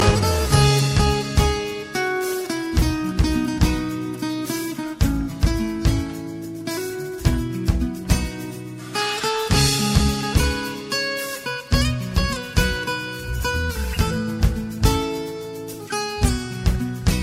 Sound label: Soft Guitar